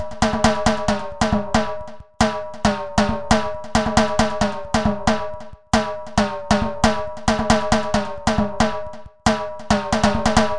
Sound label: Steel